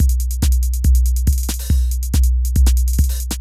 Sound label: techno 140